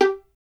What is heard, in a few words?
STR VLN, Solo Instruments; Miroslav Vitous